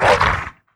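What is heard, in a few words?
Npc Skeleton Wolf Damage; FlyForInsanity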